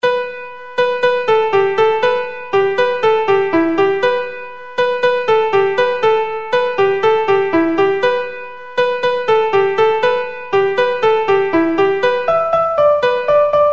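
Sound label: Pipes, Traditional Irish Music; Creggs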